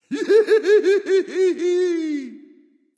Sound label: nv yay; Smite; ymir skin9